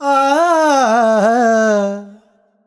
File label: Voice, Vocals Male, World Pop